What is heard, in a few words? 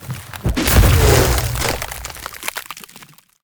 Ice Spell Whoosh, Cold Whooshes; Arctic Aura; Motorice